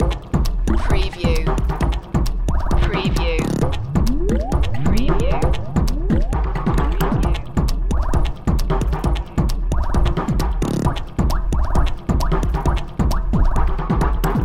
boiling point loop

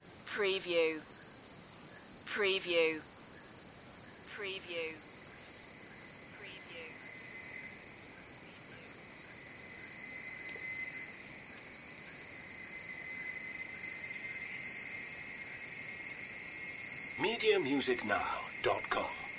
jungle crickets

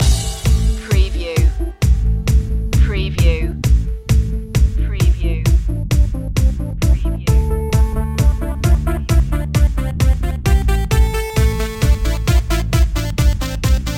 retro video game loop

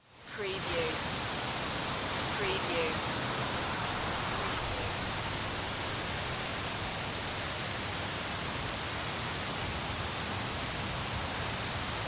Wind Forest Leaves; Sound Effects Bible

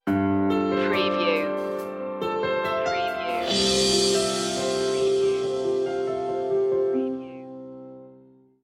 drops in time stinger

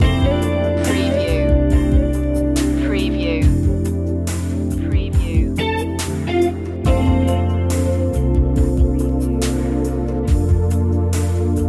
airy loop

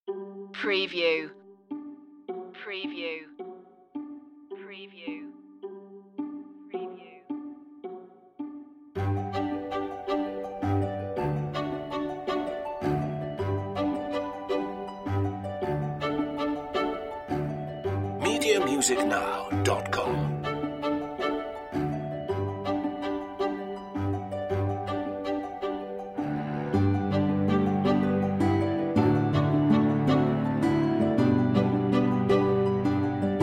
no glockenspiel or pianos

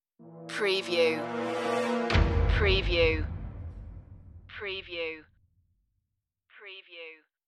industrial eeriness stinger

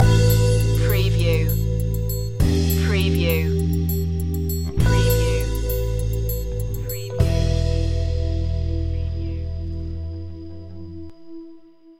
funkin is easy stinger

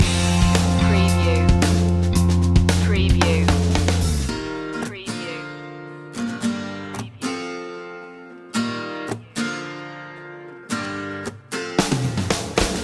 city escape loop linking no piano

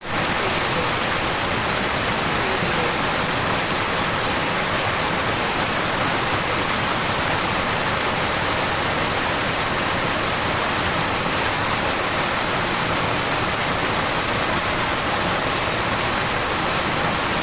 Waterfall

